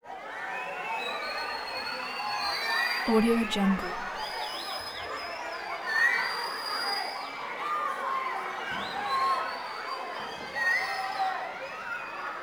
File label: auditorium crowd younger teens walla chatter; prosoundfx